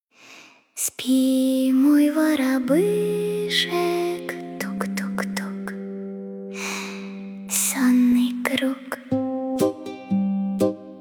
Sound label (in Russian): zvuki prirody detskaya muzyka dlya sna